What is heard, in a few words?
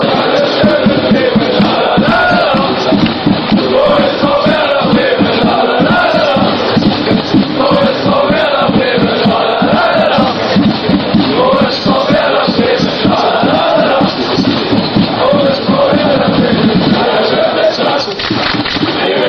schalala fan chants; werder bremen